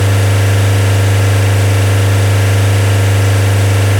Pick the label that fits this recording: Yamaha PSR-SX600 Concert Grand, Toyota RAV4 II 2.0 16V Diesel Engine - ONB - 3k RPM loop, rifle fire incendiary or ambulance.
Toyota RAV4 II 2.0 16V Diesel Engine - ONB - 3k RPM loop